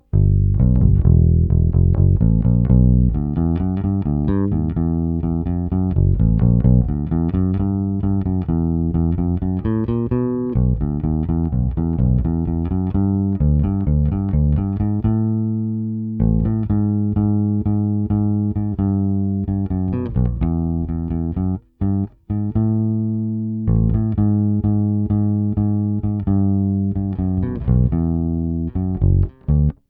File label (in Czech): Jay Turser Precision bass, La Bella - clona 0%; JTB-400C-BK-A-U; Flatwound struny LaBella 760FGS-B Deep Talkin' Bass Gold Flats 5 Standard 45-128